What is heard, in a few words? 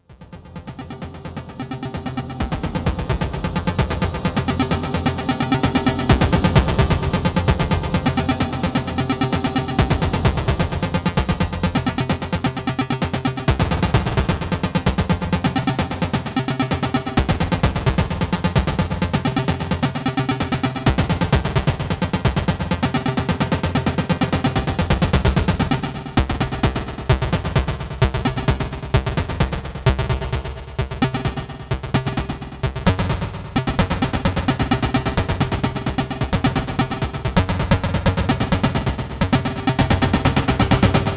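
different plucky Arpeggios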